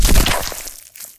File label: hit